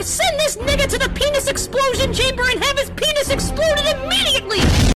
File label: exploding chamber